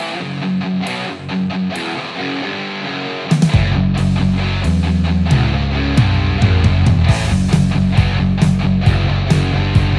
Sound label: metal guitar